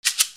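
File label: percussion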